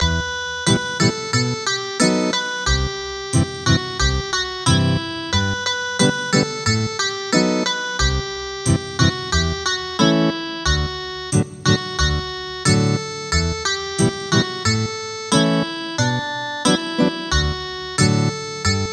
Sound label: Ethnic Guitar